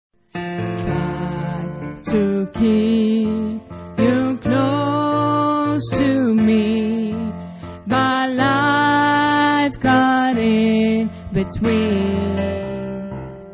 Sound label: tenor